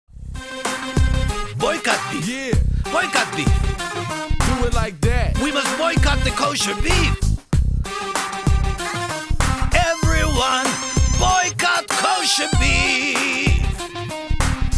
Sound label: Hip Hop Version, Orchestral